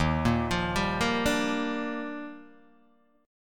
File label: Eb Major 9th, Guitar DADGAD